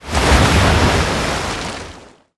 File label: disappear; Swamp Of Darkness; Liquimon